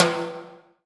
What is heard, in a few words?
TIMBALE; EdgeSounds; Drum Mashines VOL-1; R-8 DRUMS